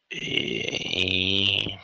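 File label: moan nasty scary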